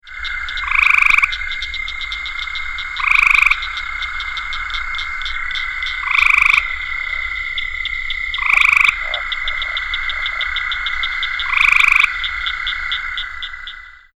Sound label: hyla versicolor long